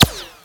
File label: dirt, hit; tacz-tweak-pack; taczmechanics